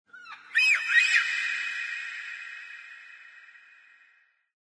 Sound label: animal call bird weird; Source Engine; tf; lair